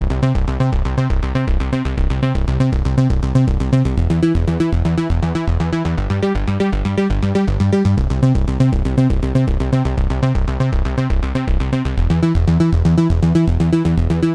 Trance Orchestral Version

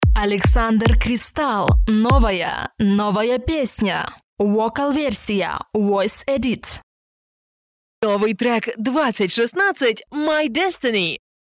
Radio Vocal Version

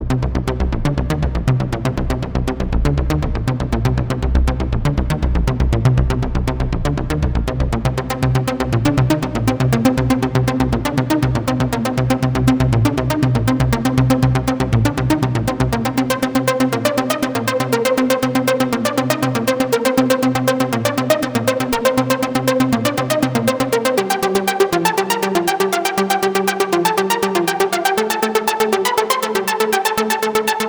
Trance Version

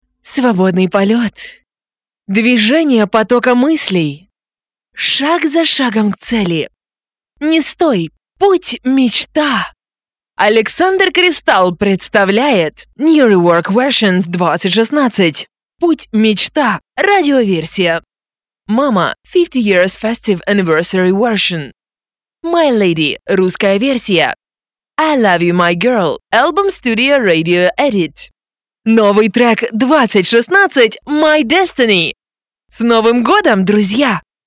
New Year Jingle

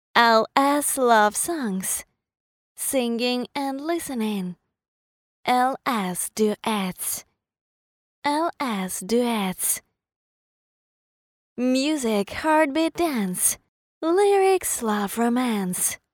Love Songs Singing Duets

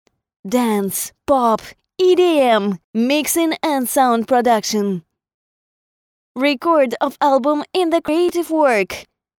Dance Pop EDM

